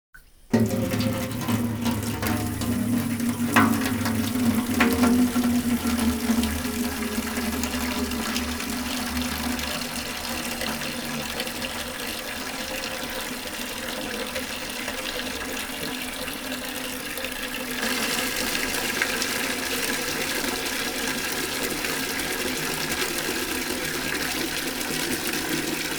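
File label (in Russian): Набирают в ведро воду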